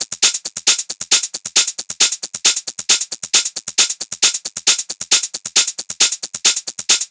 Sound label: Hat Loop 135, Techno